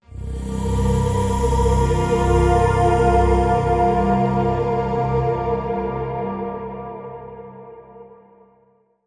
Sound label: Drone, Link Sting